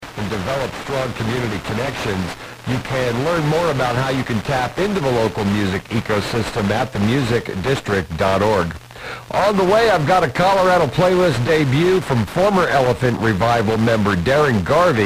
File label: koto; 91.7; Telluride; Public Radio; "Colorado Playlist" affiliate; FM DX